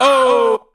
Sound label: "oh"; ooh ahh